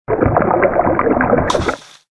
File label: Fish Cast Line Splash; Survivor The Interactive Game